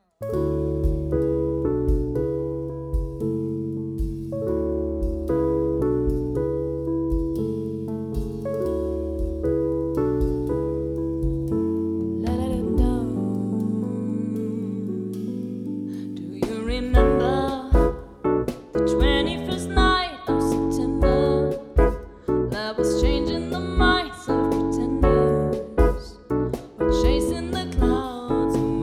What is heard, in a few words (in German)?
Loungeband Live Piano Drums Gesang; Hochzeit; Hannover; Empfang